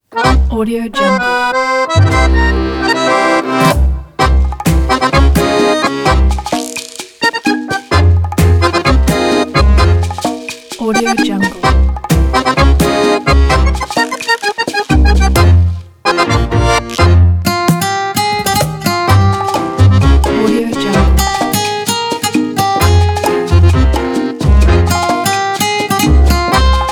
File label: old fashioned latin tango